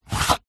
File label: foley shoe foot remove from heel stiletto; zapsplat